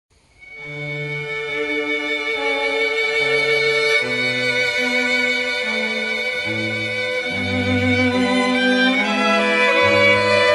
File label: air g string